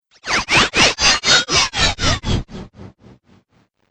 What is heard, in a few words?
Laser Robot, sci-fi laser sound effect; Andrew Fleming